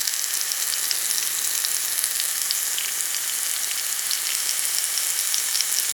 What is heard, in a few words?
cinematic cooking